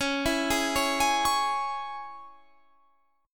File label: C sharp Minor down Guitar Standard; C#m; arpeggiated; JGuitar